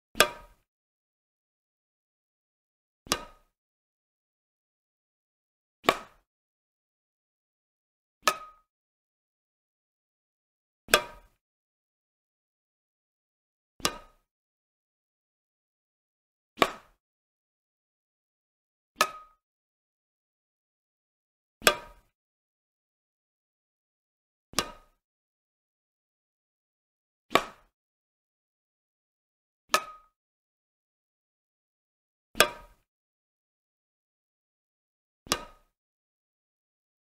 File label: badminton